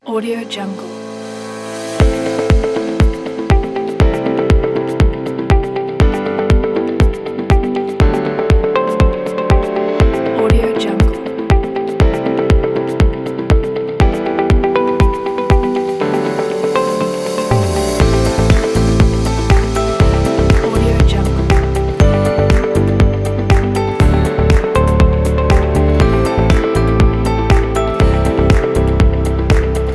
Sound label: this is corporate music